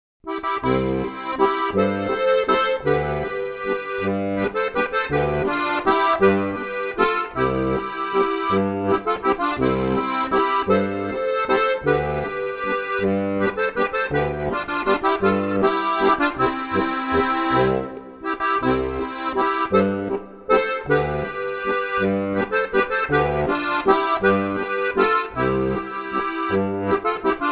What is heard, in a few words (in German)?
walzer; brixental